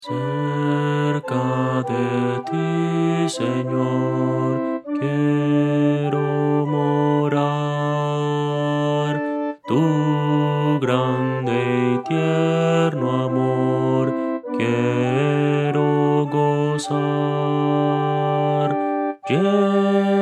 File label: contralto